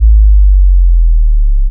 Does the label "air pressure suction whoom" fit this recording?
No